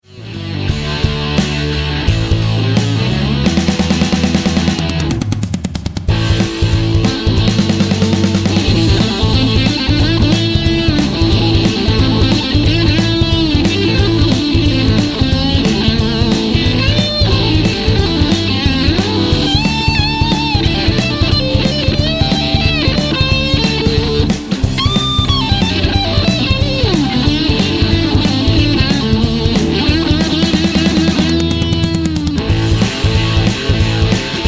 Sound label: Guitar